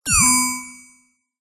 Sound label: science fiction cyberpunk robot or equipment beep; zapsplat